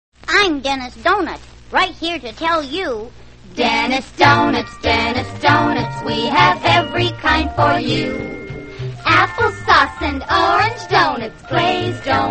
radio jingles; dennis donuts; allied radio artists

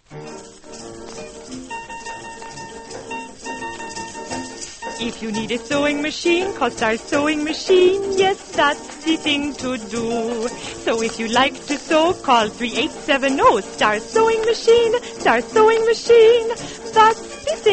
radio jingles; star sewing machines; allied radio artists

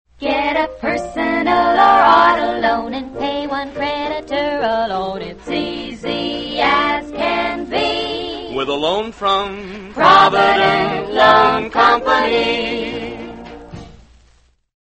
radio jingles; provident loan; allied radio artists

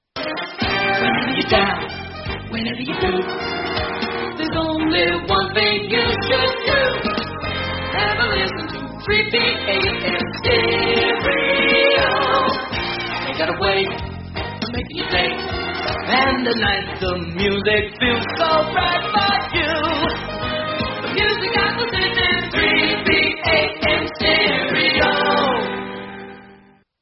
jingle; australian radio 3ba

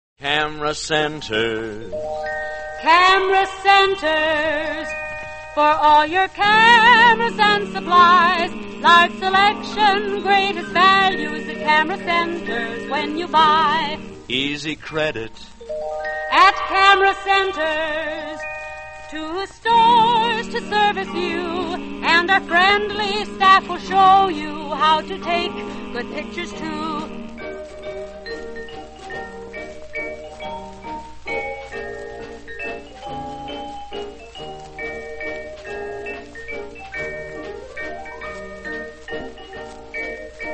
radio jingles; camera centres; allied radio artists

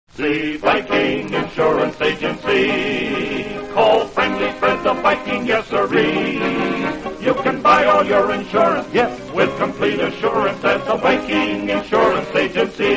radio jingles; viking insurance; allied radio artists

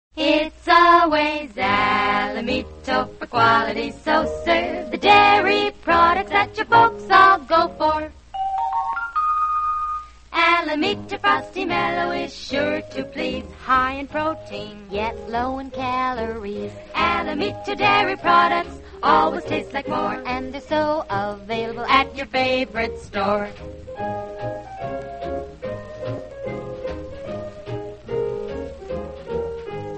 radio jingles; alamito dairy; allied radio artists

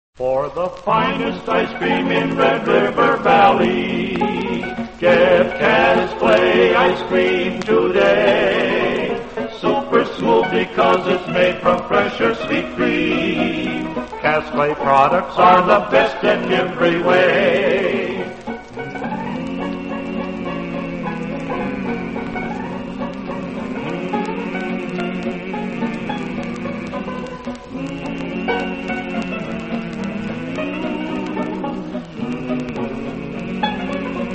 radio jingles; cass clay dairy; allied radio artists

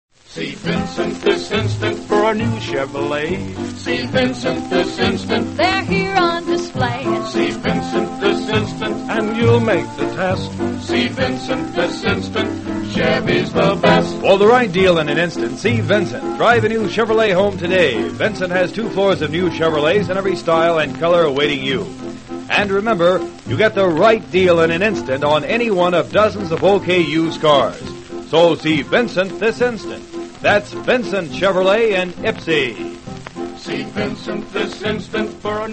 radio jingles; allied radio artists

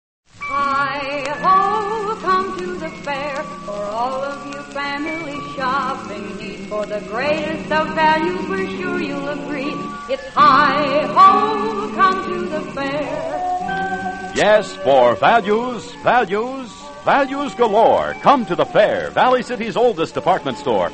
radio jingles; come to the fair; allied radio artists

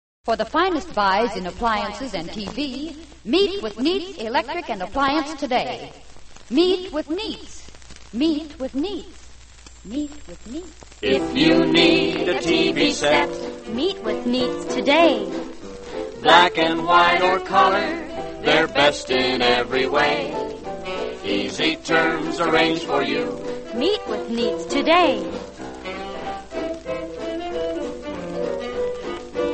radio jingles; nietz electric; allied radio artists

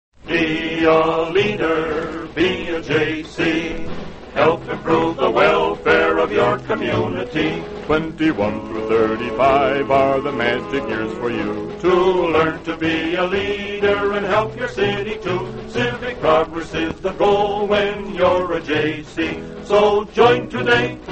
radio jingles; jay cees; allied radio artists

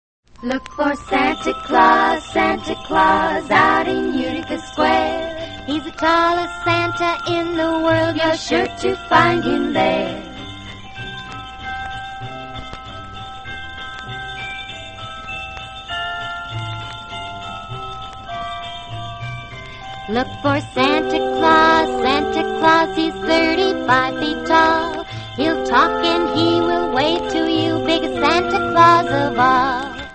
radio jingles; santa claus; allied radio artists

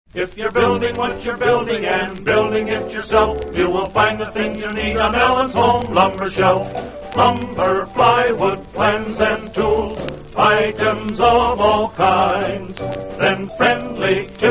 radio jingles; allen home lumber; allied radio artists

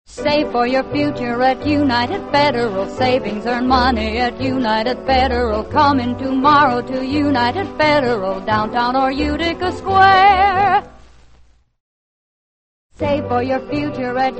radio jingles; united federal; allied radio artists

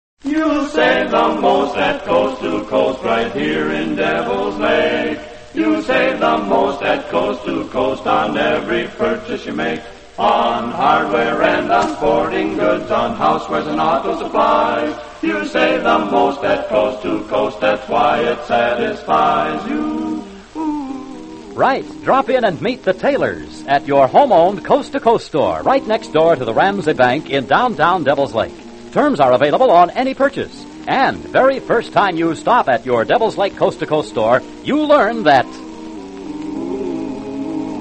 radio jingles; coast to coast; allied radio artists

